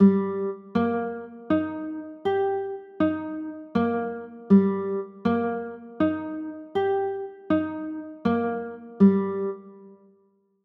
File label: e flat maj, chords